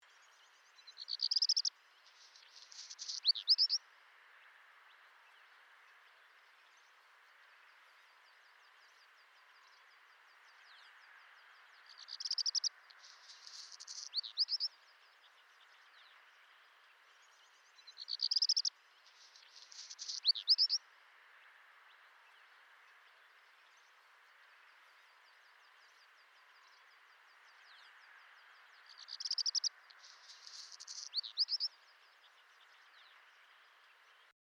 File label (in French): Rougequeue noir